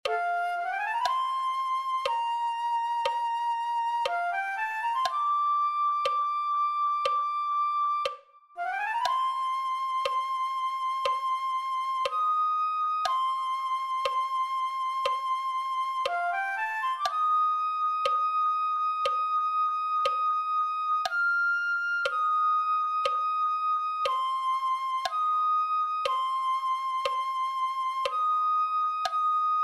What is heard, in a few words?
flute